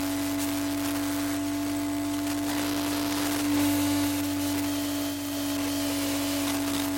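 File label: ambient hum buzz loop, electric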